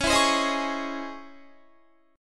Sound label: Db Minor Major 9th Db down Guitar; strummed; DbmM9; JGuitar